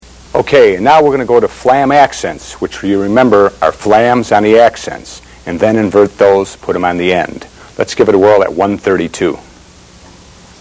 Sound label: flam accents, drums